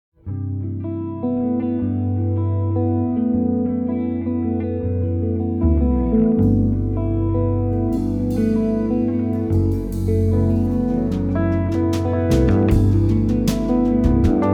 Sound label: Melody